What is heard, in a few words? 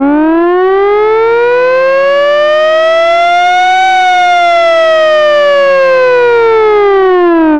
vintage hi lo siren